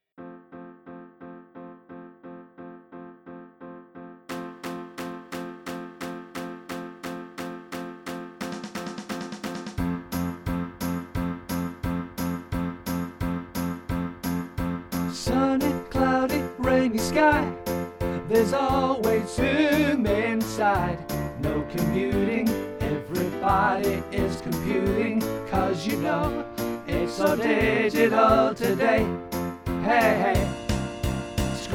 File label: Jingle; UKNC